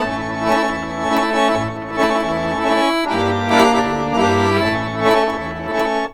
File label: ACC, Balkanish Traditions; USB Soundscan vol.63; AKAI